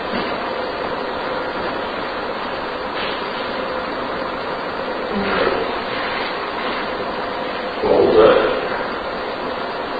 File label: Room with Fireplace Unexplained noise heard by team member Amplified; Turner Home; 2nd Floor